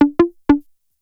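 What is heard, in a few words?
SYNT1 Left, FUNK; USB Soundscan vol.47; AKAI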